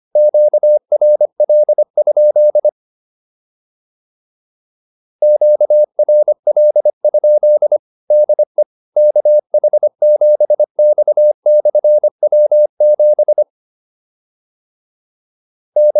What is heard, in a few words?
QSO, intermediate practice files